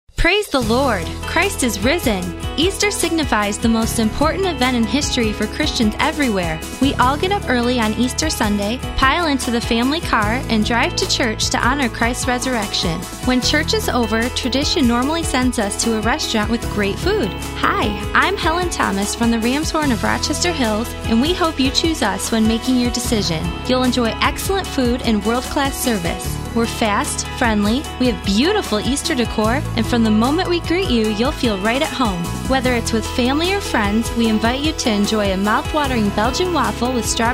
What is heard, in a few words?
Rams Horn; Easter; Easter Radio Spot